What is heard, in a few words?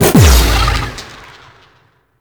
Bite Cast Burst; Warframe; Voruna